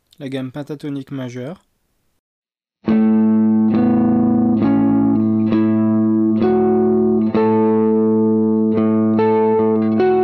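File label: single String pentatonic major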